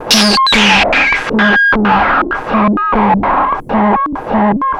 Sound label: signal, 100bpm; transx loops